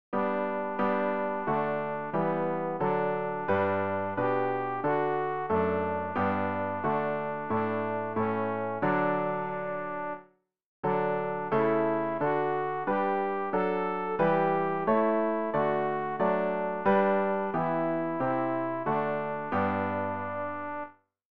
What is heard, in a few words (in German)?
alt, Übehilfen für das Erlernen von Liedern; ref gesangbuch; rg 187 wir bringen dieses kind zu dir